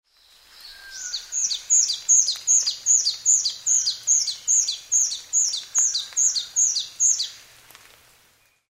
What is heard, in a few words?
white browed scrubwren